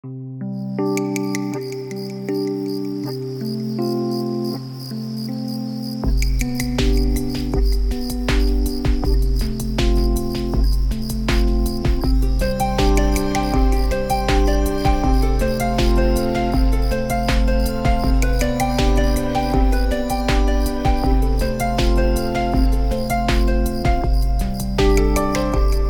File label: cicada; Рингтоны, звуки будильника, уведомлений и системные сигналы смартфона Alcatel